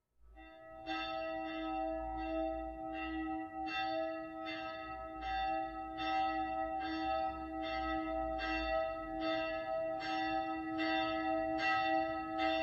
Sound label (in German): Glocke e, Domglocken; Raphael